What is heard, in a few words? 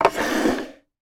small plastic object slide across table